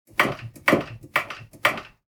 Hydraulic chair adjustment foot pump

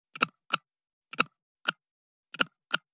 Camping flashlight turning on and off

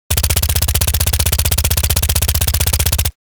Engineer submachine gun full auto fire

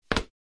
Hardcover book set down

